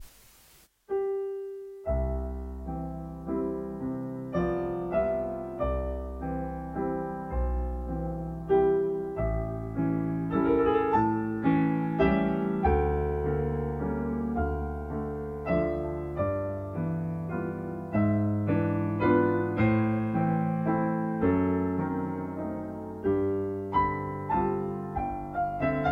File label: pitch shifted; chopin